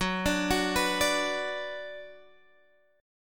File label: F sharp Suspended 4th Sharp 5th, Guitar, Open G; x,11,12,11,12,12; down